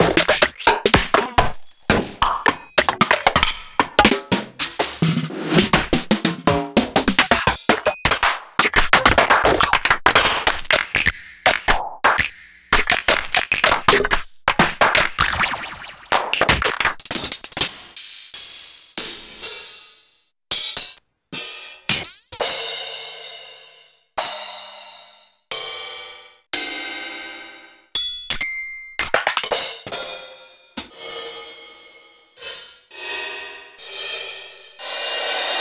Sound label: Zoom SB-2461